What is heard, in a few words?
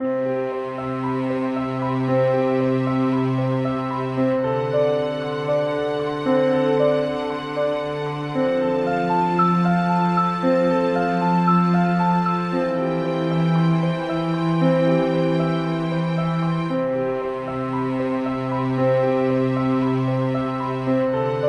beginning samsung ringtone